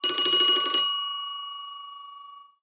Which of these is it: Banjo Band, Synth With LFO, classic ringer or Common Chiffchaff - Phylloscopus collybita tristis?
classic ringer